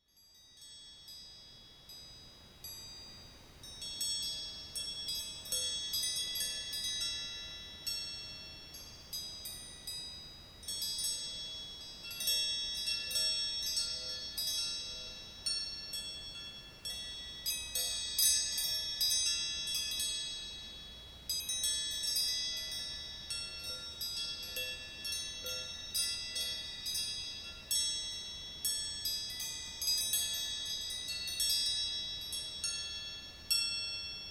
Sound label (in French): carillon soleil